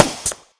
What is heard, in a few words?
border tranq shot; Papers Please